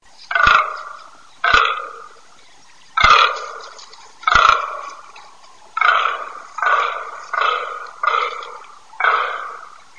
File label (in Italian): DAINO